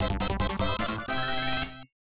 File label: Stage Start; Bomber Through; GoGo Jump Hero Gaiden 2; IBM PC/AT